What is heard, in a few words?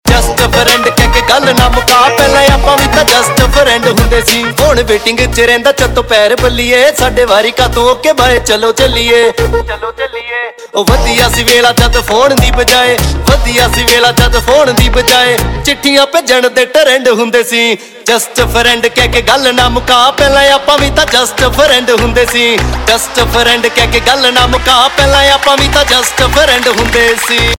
Ringtone, Punjabi